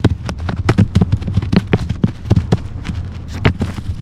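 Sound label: Rock Dirt Roll Loop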